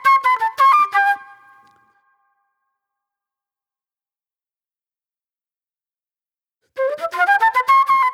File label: Old Soul Flute